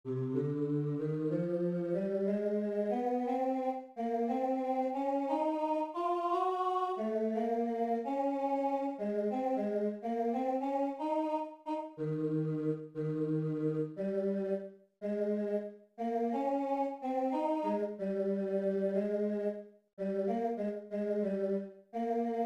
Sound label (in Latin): stabat mater tenor